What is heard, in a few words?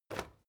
step crowd march quiet single right; schritte